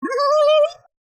Squeaky long; CinemaSpice; Mograph Mini Transitions

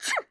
Human Female Sneeze

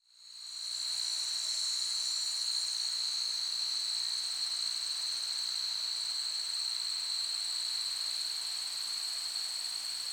Ambience Swamp; AdobeCC

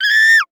Animal Primate Ape Chimpanzee Scream; animals; AdobeCC

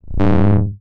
Science Fiction Sci-Fi Electronic Power On

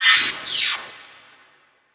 Title Transition Laser Long; Production Element

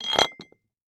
Weight Sliding On Metal Weight Lifting Bar; Sports

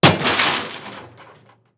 Crash Impact Car Crash Hit; crashes; AdobeCC

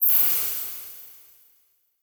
Transition Hit Impact Long; Production Element

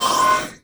Multimedia Internet CD-Rom Flash Pop Up

